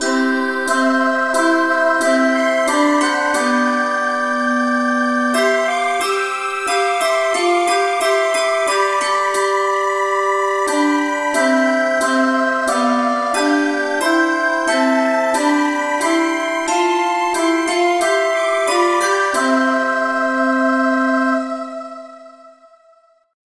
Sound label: choir key c